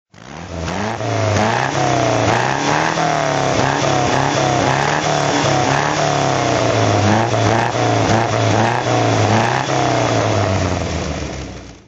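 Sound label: mgb throttle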